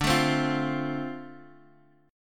Boj Dm7

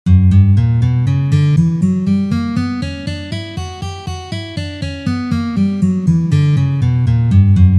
Gamma Si minor garmonicheskij vid pozitsiya

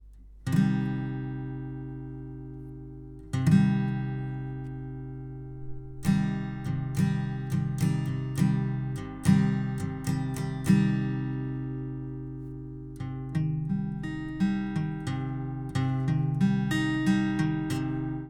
Akkord Cm 3 lad

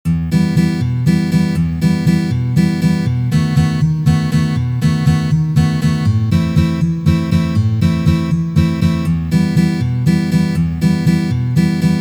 Valsovyj ritm

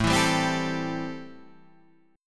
Boj Am7

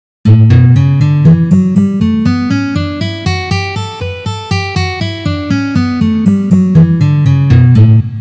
Gamma Si minor pozitsiya

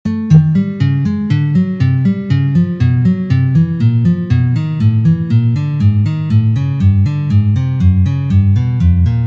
Trenirovka na gitare Dvizhenie zmei vverh

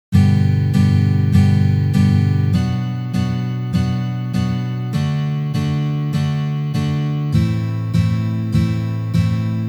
Uprazhneniya dlya igry akkorda Sol mazhor na gitare

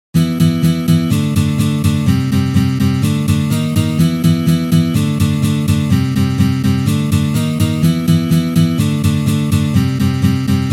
taby bridzha

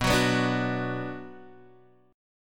Boj Bm

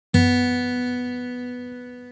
Vtoraya struna na gitare

